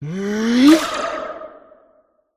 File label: mage student attack voice